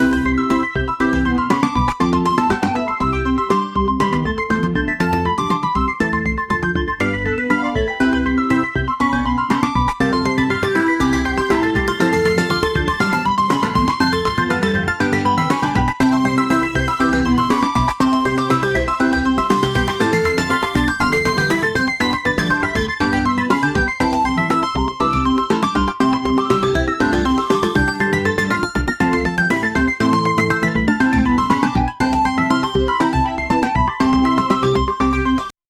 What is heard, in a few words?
melodious fun arcade musi